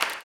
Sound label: CLAP, Blues Soul; Spectrasonics Vocal Planet